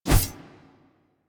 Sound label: Melee Block Start, Whooshes; Warframe; Weapons